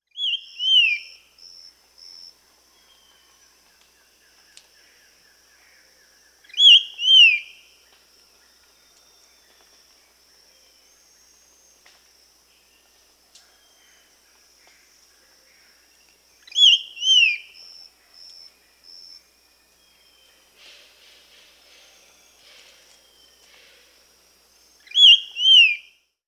Ringed Antpipit (Corythopis torquata), Peru; S. America

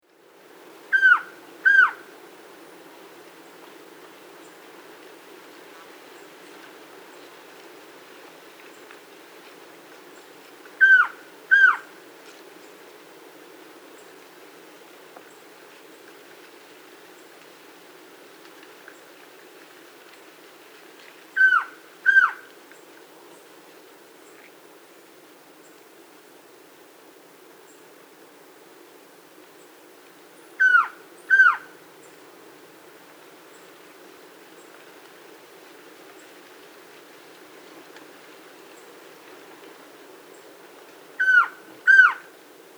Song, call, Birds of the Costa Rican Cloud Forest

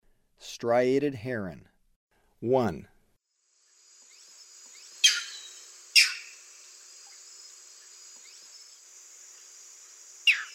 Striated Heron; S. America; Eastern Lowlands

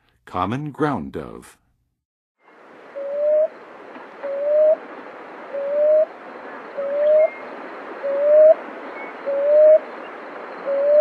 Common Ground-Dove, Birds of North America; Western USA; Western USA Songbirds

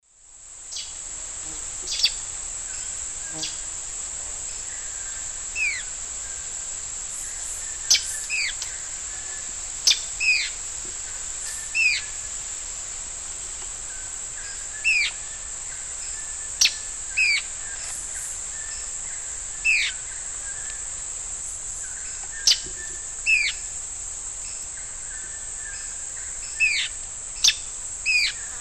Hypocnemoides melanopogon, calls; S. America; Eastern Lowlands

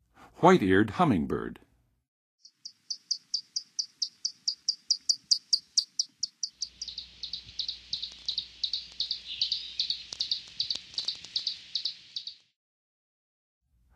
White-eared Hummingbird, Songs of Birds; North America; Western USA; Western USA Songbirds

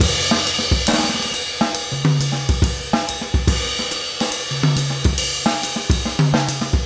trip jazz 140

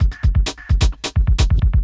electro kit 130

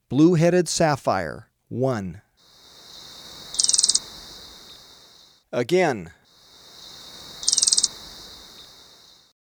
Blue-Headed Sapphire; S. America

